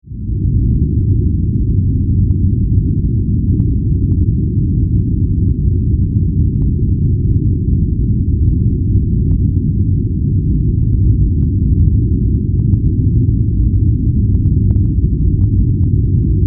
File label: Drone, Rumble, Warble, Scifi